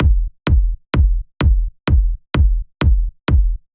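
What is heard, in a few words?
Drum Loop 128BPM bass drum; VEC5 Drumloops; VEC5 Multi Loops 128BPM; Loop Elements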